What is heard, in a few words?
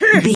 voice female attack